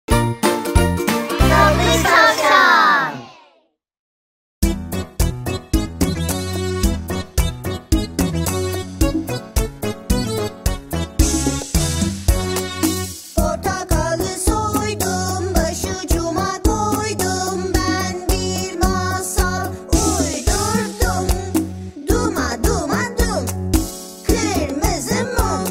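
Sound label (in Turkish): eğlenceli çocuk şarkısı tekerleme; portakali soydum basucuma koydum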